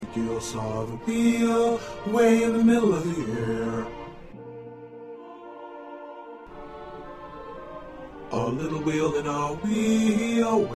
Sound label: Bass, choir